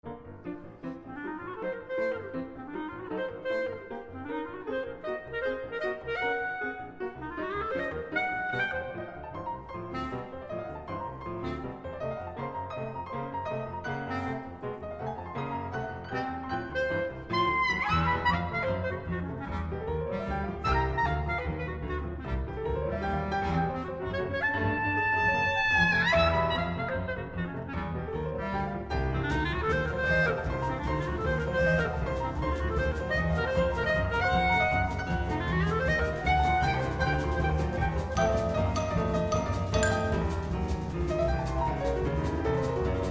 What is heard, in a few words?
Riffs